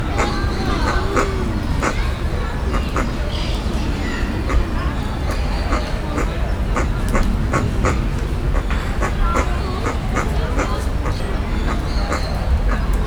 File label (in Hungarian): folyamatosan de halkan, tüskés nyakú íbisz; debreceni zoo 2019